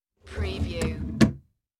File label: Drawer Close Desk; Sound Effects Bible